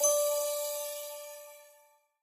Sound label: crossbow multiplier score; Skillzgaming; battle royale desktop